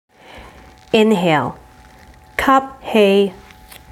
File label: Inhale; Chinese Canadian Pharmacists' Association